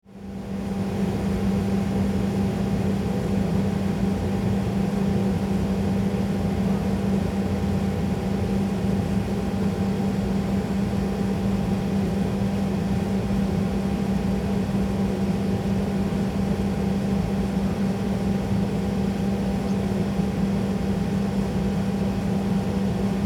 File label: Airplane Jet Idle Interior